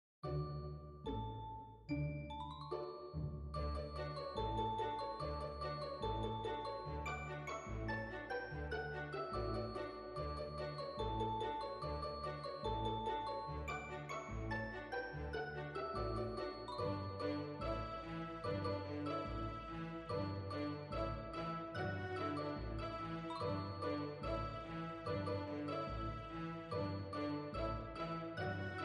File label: comical pizzicato